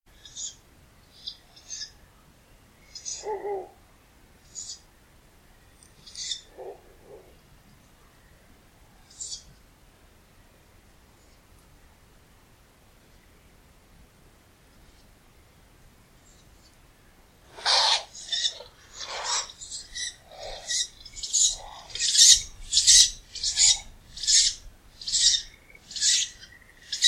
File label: begging